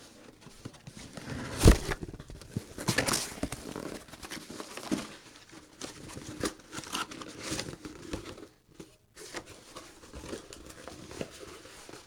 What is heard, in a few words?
Cardboard Box